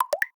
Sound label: notifier dropplet; Mozilla; Boot2Gecko